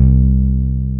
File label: synth bass C2